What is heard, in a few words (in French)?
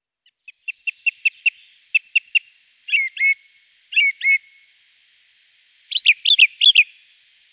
grive musicienne; environnement